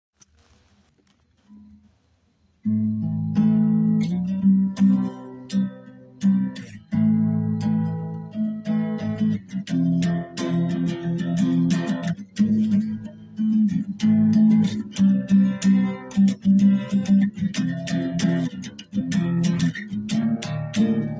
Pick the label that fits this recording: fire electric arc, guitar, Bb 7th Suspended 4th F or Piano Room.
guitar